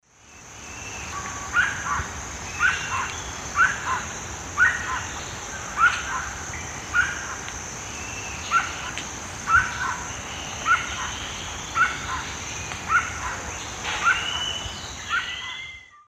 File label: Piaya melanogaster, song; S. America; Eastern Lowlands